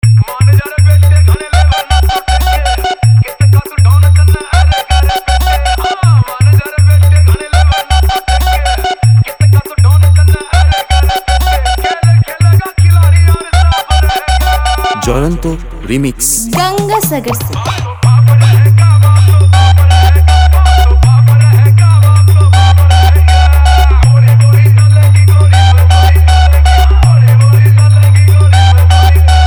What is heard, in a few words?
Horror Sound Long Humming Mix